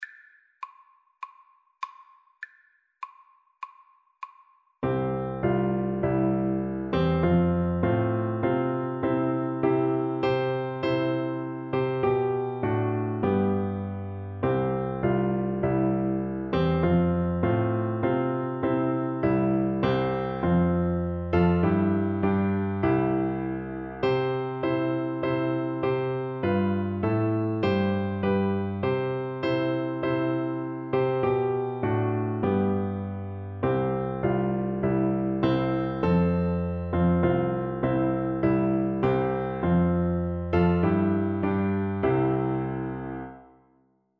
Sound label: Alto Recorder